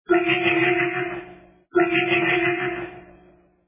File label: sword swings; OST Убить Билла